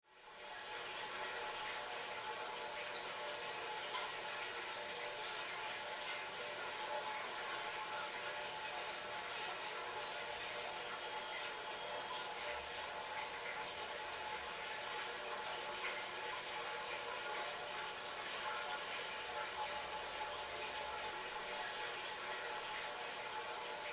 Water Pipe Water Flow Through; Kitchen; Household; SFX Bible

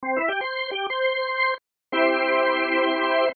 Music Organ Charge, Music Organ Charge Ascend; Keyboards; Musical

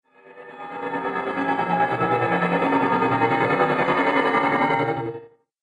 Horror Drone Haunting And Disturbing; Scary Soundscape Sound Effects

